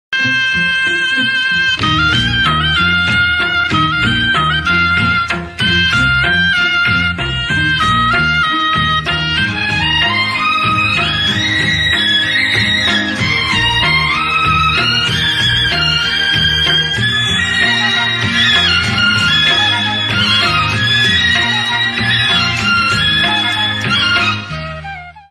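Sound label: ringtone violin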